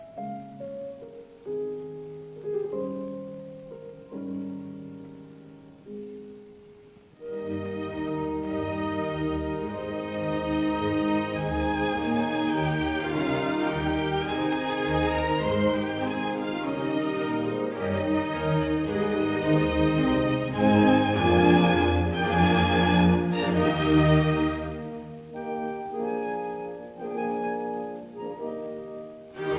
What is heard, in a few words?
Piano Concerto in C major Largo; Op 15; Walter Gieseking